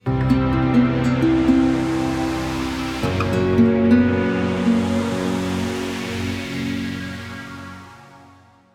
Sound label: mystery stinger; Auden; transistor